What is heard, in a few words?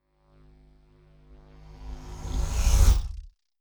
sci-fi vehicle drive by buzz; raumschiff